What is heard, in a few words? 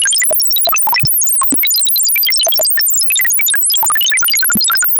Pseudo Random Noise 18ms Durations